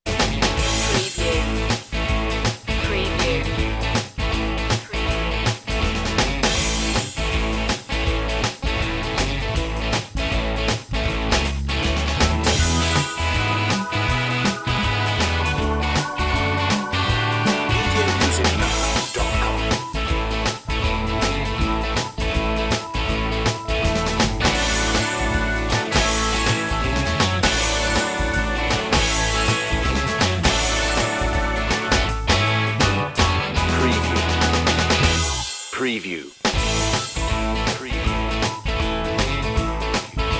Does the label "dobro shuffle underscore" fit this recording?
Yes